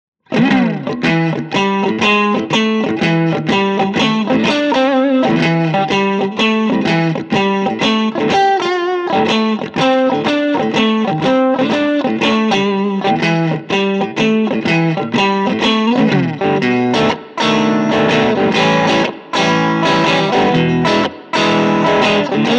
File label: characteristic sound; jensentone